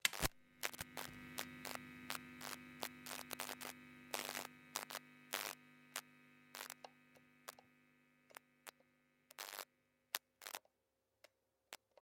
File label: light switch; MISSING FRIEND